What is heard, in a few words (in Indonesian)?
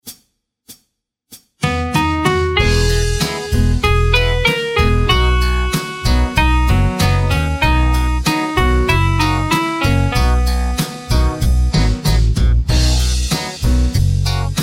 Instrumen Minus One Nada Rendah